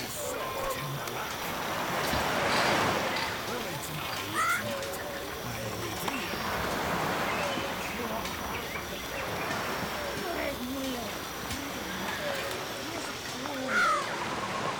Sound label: island village pirate loop, ambiences; Michael Ghelfi